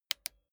bicycle street gear control down

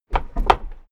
car lift down short heavy

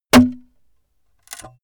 sword hit wood pullout

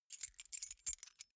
key ring small rattle

